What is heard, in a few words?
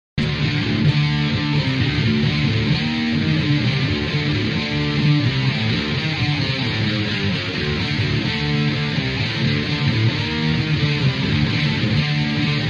GUITAR metal